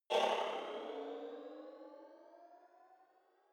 sci-fi vehicle turbo activate modulated; raumschiff

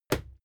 fight impact sweetener punch bright; nahkampf; soundpool

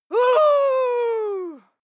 female fight attack yell; schreie; menschen

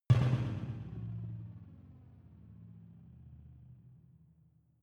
sci-fi vehicle engine stall small; raumschiff

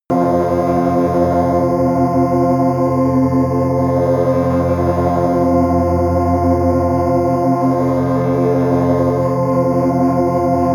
tense organ

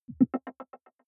button echo marble bubble single; maschinen; signale; soundpool

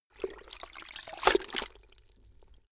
cloth handwash; haus-garten; haushalt

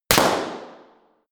snap

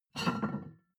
plate on plate take medium, restaurant cafe; sport-freizeit

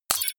sci-fi click positive light; maschinen; signale; soundpool

